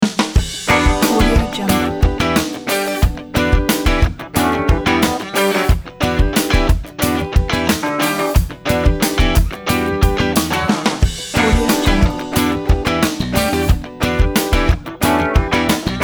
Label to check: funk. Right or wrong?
Right